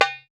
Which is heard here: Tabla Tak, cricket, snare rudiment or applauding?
Tabla Tak